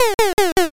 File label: laugh single